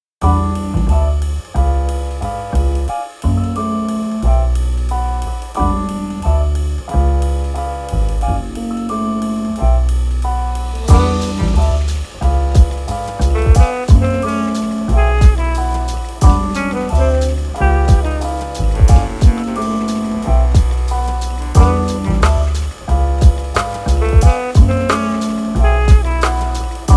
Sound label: LoFi Music; pixabay music